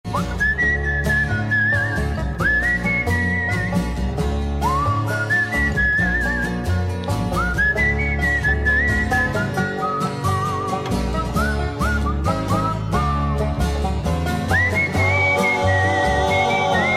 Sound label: Whistling